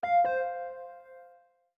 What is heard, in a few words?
Windows Vista Hardware Remove